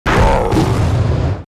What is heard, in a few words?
attack